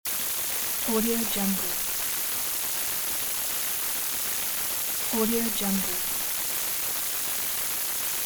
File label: interference; ponyrai